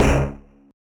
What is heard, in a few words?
marimba steeldrum; ゆめ2っき